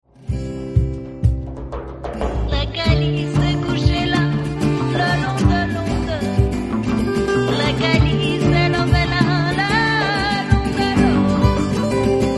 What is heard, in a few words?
rumba